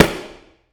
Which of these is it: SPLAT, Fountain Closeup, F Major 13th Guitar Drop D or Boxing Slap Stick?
Boxing Slap Stick